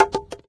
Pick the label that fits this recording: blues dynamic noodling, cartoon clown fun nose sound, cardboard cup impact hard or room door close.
cardboard cup impact hard